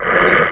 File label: wolf pre attack; The Monster Plane; kolmods preatt